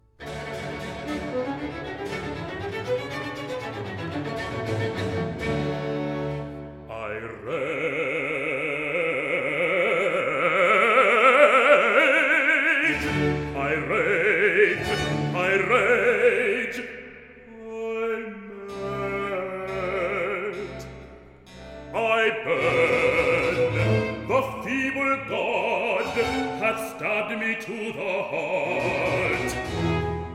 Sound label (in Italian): Recitativo accompagnato; I rage; Handel Masterworks